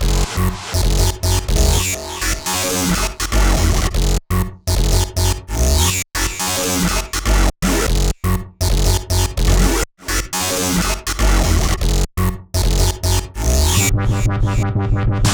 122 F# Full Mix, Complex Electro Synths; Atomic; Prime Loops

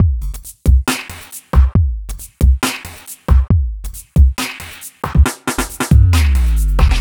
Bpm137 Subend Break, Dubstep Drum Loops

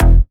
Bass Shot E

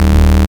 Bass Shot D#

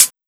Closed Hihat; Cymbals; Mutekki Media; Vengeance Electroshock Vol.2

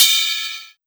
Ride, Cymbals

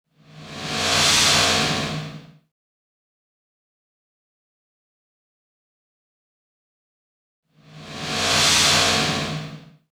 130 D#m Sfx, Bpm130, Complex Electro Synths; Prime Loops; Bias

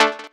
Synth Shot D

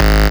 Bass Shot G#

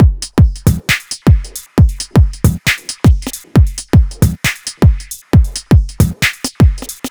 Bpm135 High Break, dubstep drum loops

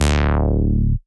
Bass Shot D

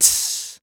Human Beatbox, Beatbox Hits

